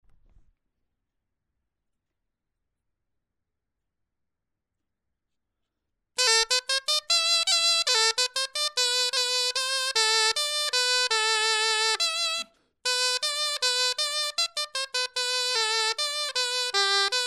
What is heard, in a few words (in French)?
clarin etude